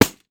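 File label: LARGE BRAZIL, PANDEIRO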